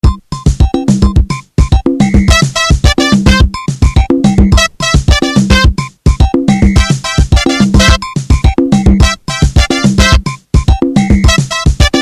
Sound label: Samba; SambaBossaNova